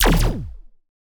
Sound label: laser; spacemap; lasers